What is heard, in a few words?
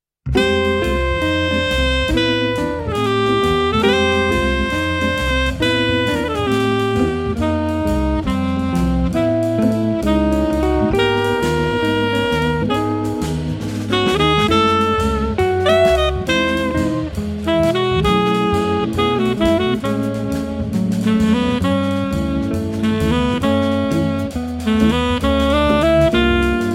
Night Waltz